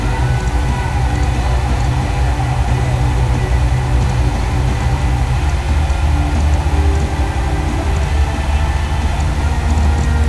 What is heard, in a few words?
mic